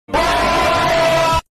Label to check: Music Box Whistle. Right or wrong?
Wrong